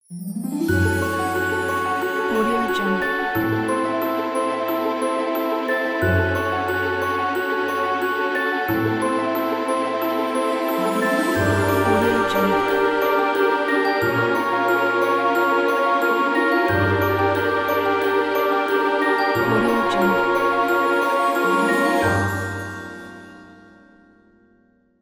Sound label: mystical ident